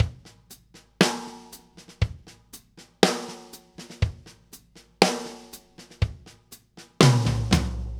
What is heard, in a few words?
New York Loop, Drum Tools, Laidback